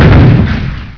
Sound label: rhino, weapons; ParoxysmII